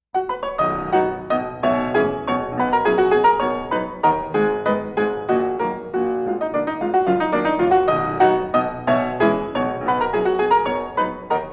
Waltzes for piano, 4 hands (or piano), in B Major, Tempo giusto; Brahms